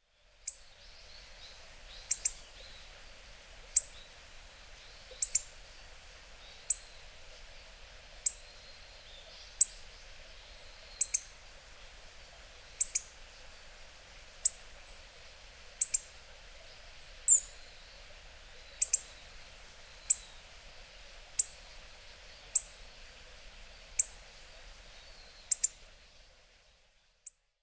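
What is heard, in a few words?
hawfinch, hyogo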